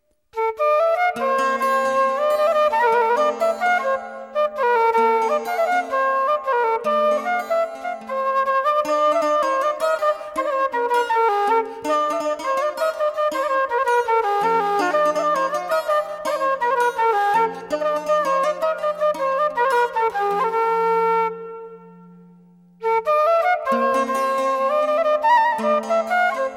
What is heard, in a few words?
Plinn Bal